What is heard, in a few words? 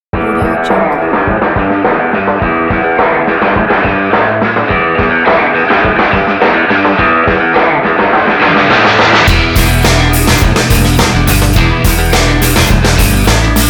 blues rock kit; musicaura